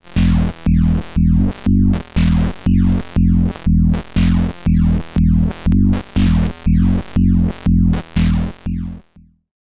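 E-RM Polygogo Doepfer A 155 und Soundforce Dual LFO Loop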